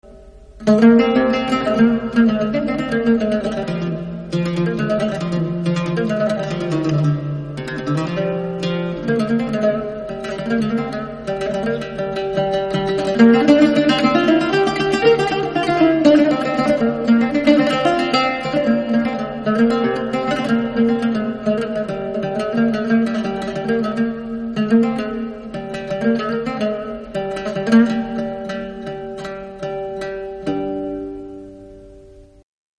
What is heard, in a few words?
oud